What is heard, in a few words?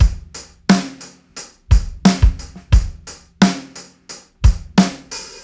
88bpm rock drum loop; Hayman